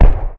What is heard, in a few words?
combat atmosphere; World of Tanks